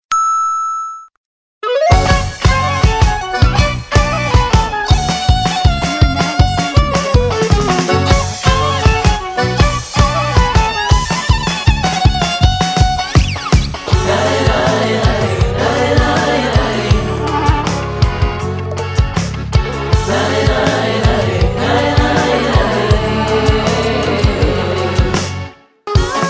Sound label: Violin